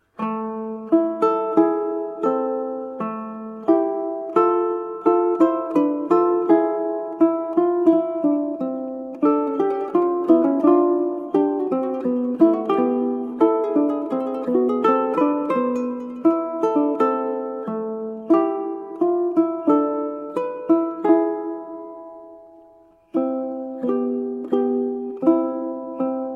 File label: Adagio from Sonata in A minor, Classical Ukulele